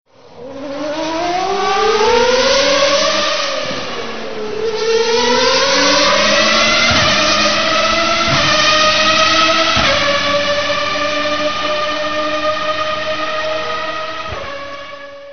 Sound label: Ferrari Enzo Engine